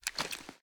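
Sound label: pistol 1911 raise; gmod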